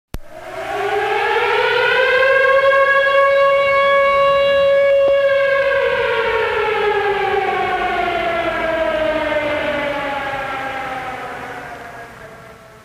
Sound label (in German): Sirene